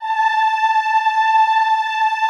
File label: EHH A4, Classical Choir; Best Service; Extended Classical Choir; EHH MIX